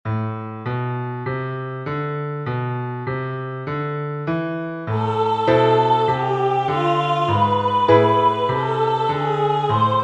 entoacion; Melodía e acompañamento; Exercicio 2

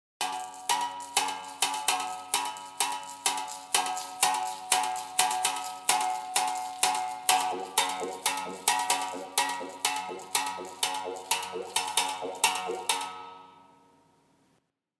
birimbao

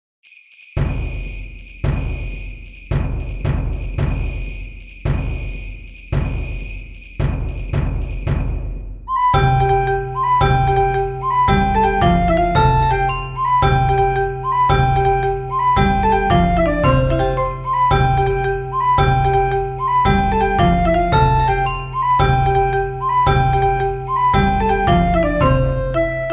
Muineira de Lugo conxunto instrumental

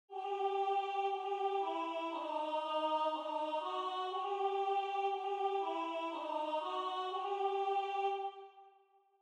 La Tarara retrouso 4 voces voz 3

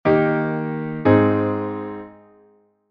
perfecta en Lab M; Cadencia Auténtica Perfecta; As cadencias

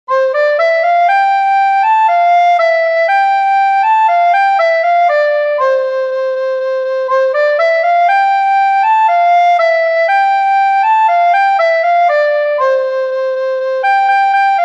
A Saia da Carolina, frauta doce

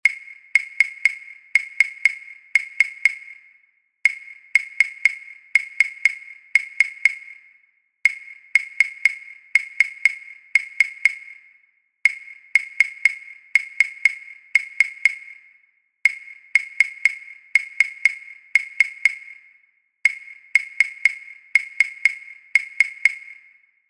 Caixa Chinesa

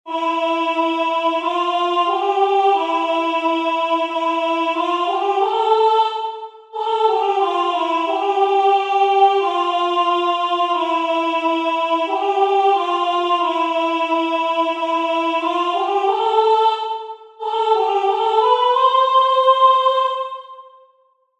VOZ; Audio da voz

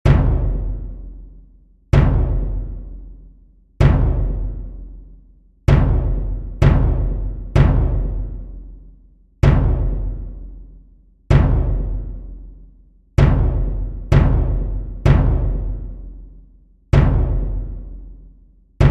Bombo; Non chas quero

